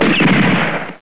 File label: Gunshot